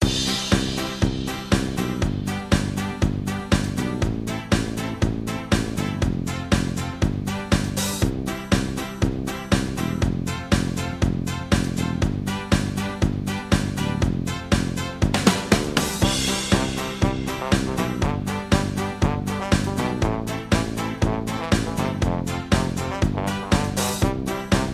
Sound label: Ska Theme